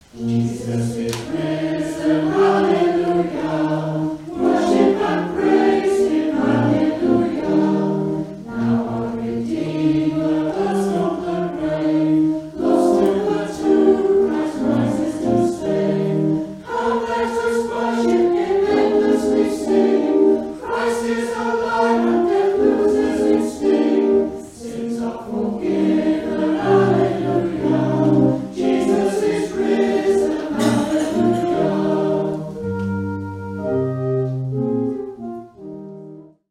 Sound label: choir sound